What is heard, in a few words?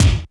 HR16B BD